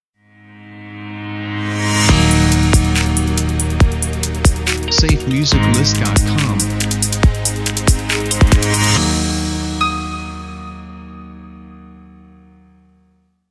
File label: Inspiring Opener Logo; SafeMusicList